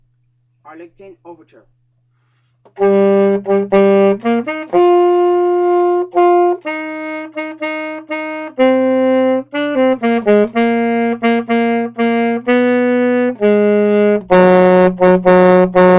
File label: Saxophone